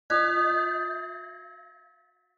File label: Onoma Negative Dry